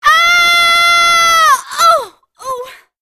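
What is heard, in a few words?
Aaaaaah Oh Oooh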